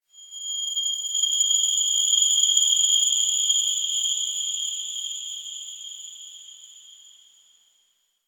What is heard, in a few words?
Factory Texture, Ambience; Warframe; Quests; TheNewWar; Zariman